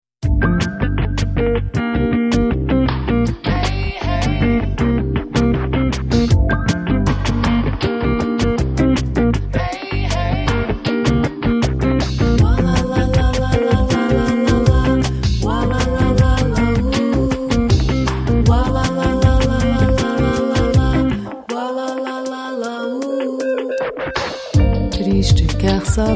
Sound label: drum n bossa